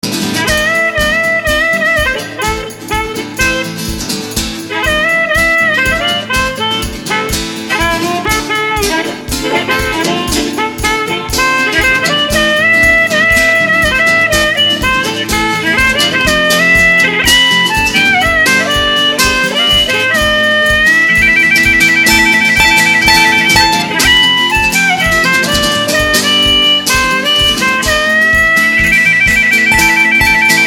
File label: young bird harp